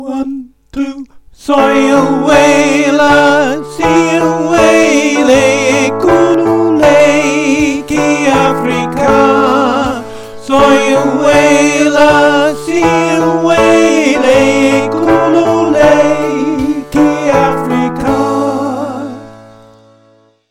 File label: tenor voice drums